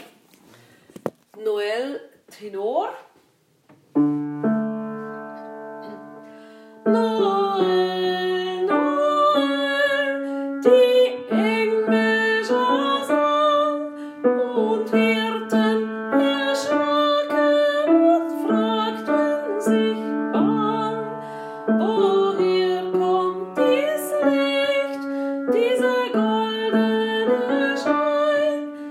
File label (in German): Noel Tenor